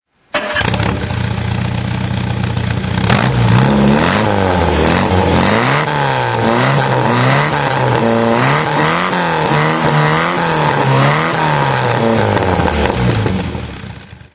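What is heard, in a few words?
austin healey sprite frogeye throttle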